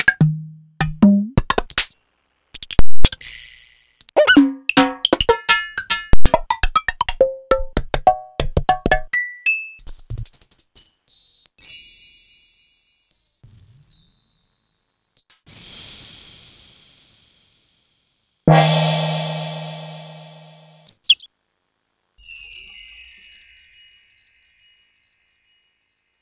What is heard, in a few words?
Korg Triton Triton Percussion